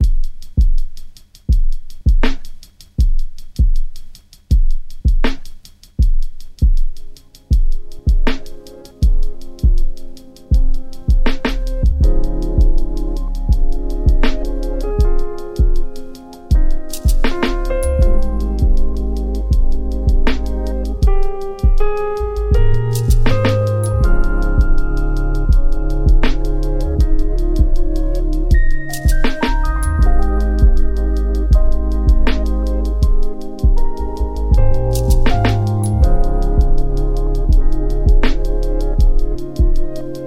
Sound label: chill